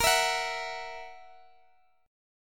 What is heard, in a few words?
F sharp 7th, A sharp